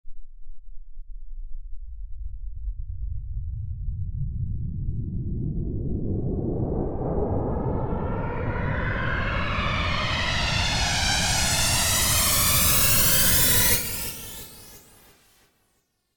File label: Pink Noise Effix Delayed; Techno